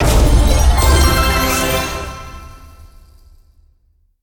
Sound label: win large